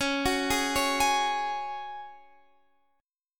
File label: C sharp Suspended 4th, Guitar Standard; C#sus4; arpeggiated; 21,21,18,18,21,x; down; capo=9